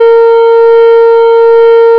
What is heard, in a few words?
fm voice single2; cosine; FFTimbre